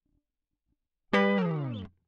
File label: Guit Solo Line, RNR for piano